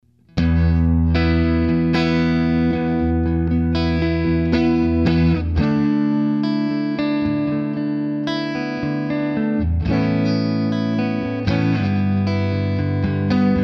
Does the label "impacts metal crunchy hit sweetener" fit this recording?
No